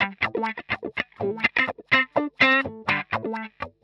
125 wah A, wahwah; PowerFX - Nu Jazz House

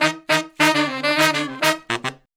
Horn Section

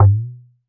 Talking Drum Up, Percussion; Roland BK-5

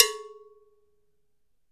LOW CUP GONG, AFRICAN PERC; AKAI S6000 CD-ROM - WorldSounds

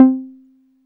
Dance Floor Bass, Multisample Basses

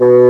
BASSOON B2

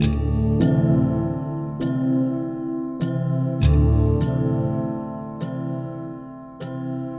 Dalang Walk, Ubud Loop; Sonic Foundry (Sony Creative Software) - Friendly Fire

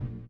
VIBRA; Spectrasonics; Metamorphosis

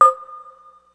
Syn Cowbell, Ensoniq ASR-X Pro

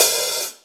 half open, Drums Percussion; Zero-G; Total Drum Bass

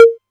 Conga Hi, Novation Drumstation; DrumstationV1

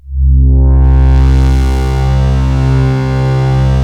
FILTER C1, BASS; Q Up Arts; Dream Experience

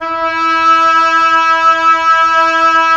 WND OB F, Oboe f; Roland - LA Composer vol. 4

